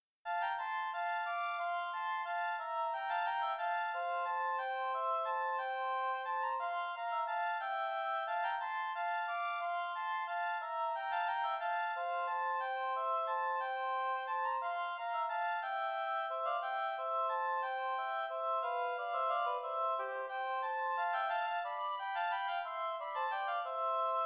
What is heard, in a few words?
oboe duet; Schultze Passepied